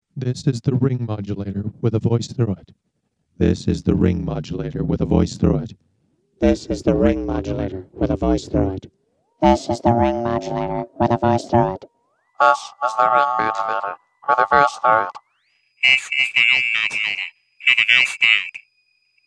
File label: Internal Osc At Freq Steps X Voice, ring modulator; analog synth